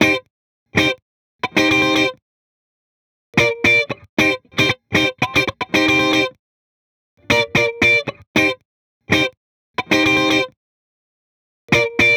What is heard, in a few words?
Guitar Strumming NO Effects 115 BPM